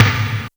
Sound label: snare exciter